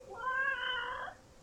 Grass Hedgehog meow

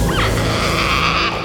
Prenatal roar; Godzilla

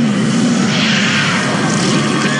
Mutant Giant Squid; Godzilla The Series

